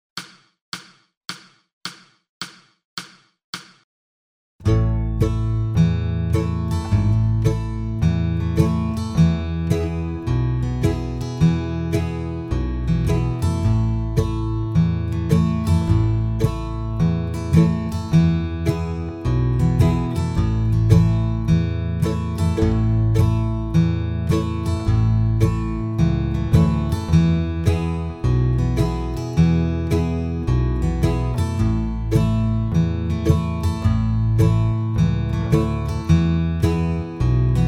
Slow Rhythm

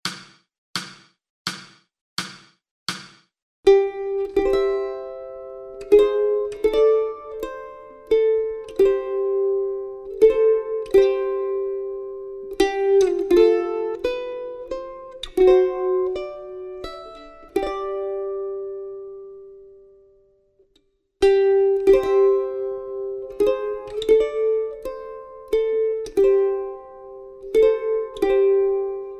midnight clear mandolin solo

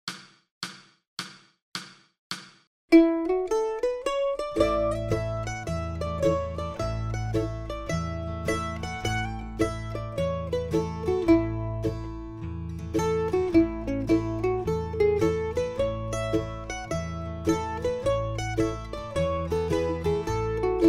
Gold Rush Mandolin Slow

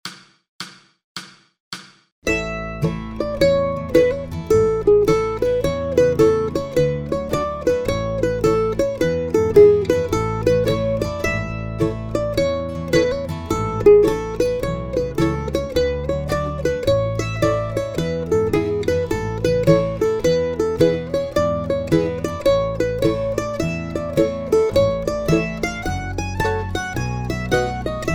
mandolin grass slow; deck the halls

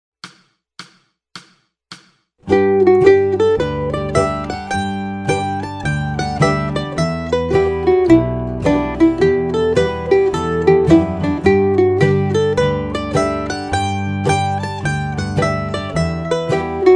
Temperance Reel Slow Mandolin

